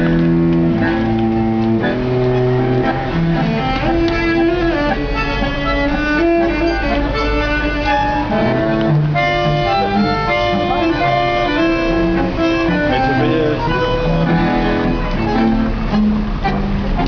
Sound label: busker tango